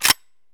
slider down; kedr; Escape from Tarkov

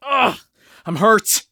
hurt medium; Escape from Tarkov

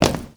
wood land

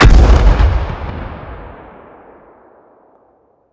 impacts; World of Tanks

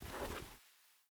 bota bag deploy; Rust

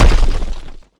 large rock impact

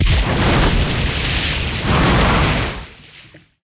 Aircrafts Sea Landing; DCS

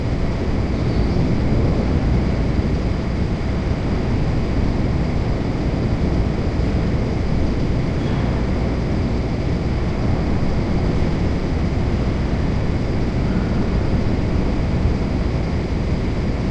ambient; World of Tanks

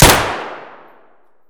gyrza indoor close; Escape from Tarkov

